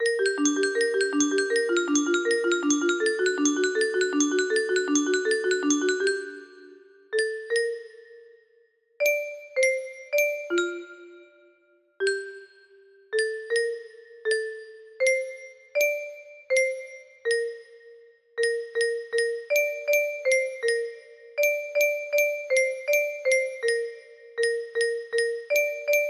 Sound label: theme piano, music box; gravity falls